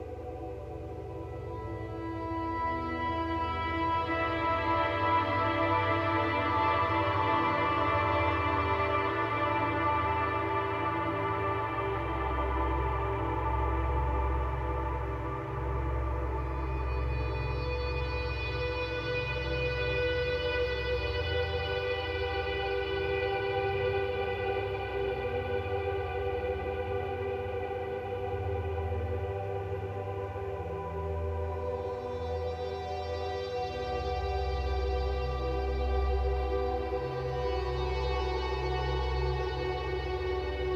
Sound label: Musical Bed; Santuario